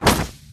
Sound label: damage; ahlbi